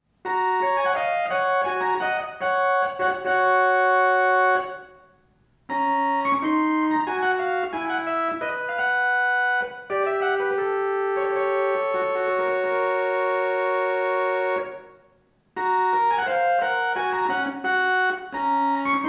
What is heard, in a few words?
Organ Miniature